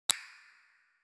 minimal abstract camera t, monochrome

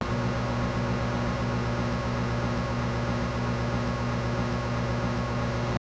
mini harvesters mechanica; agriculture

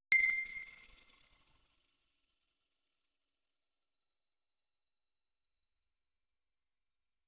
magical item drop

